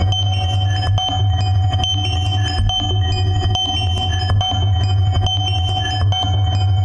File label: 140 columbine, SYNTH AND ELECTRONIC LOOPS